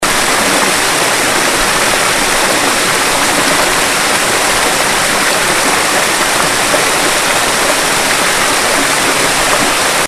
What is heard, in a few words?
Negro Hill Brook Falls